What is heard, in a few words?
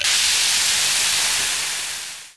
toxic slime sizzle; Half Life Model Pack; Half Life 2; ambient levels canals